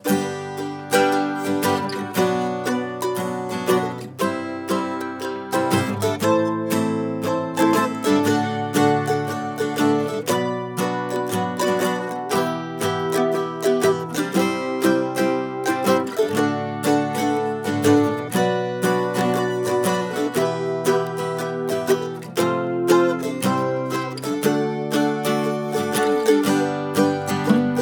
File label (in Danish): slutsang mandolin amp; curtainfalls